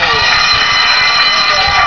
ring

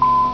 right pos

